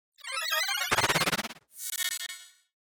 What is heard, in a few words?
Tape Rewind Chopper Front To Rear; Tape Rewind Chopper Front To Rear Movement Production Element Imaging Element Accent Transition; Tape Player; 5.1 STEREO MIX; Blastwave